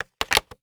Switchboard Telephone Receiver Down; Communications

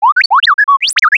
Little Droid

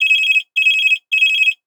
Telephone Ring; Communications

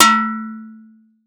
Metal Hit

